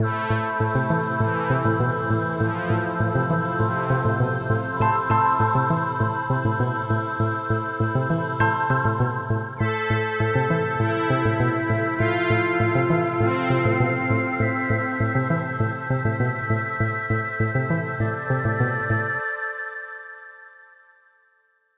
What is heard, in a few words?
Acolyte Stinger; dying light the following gamerip 2016